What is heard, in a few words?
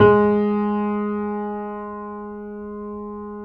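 G#3, grand piano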